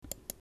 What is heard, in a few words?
left mouse button click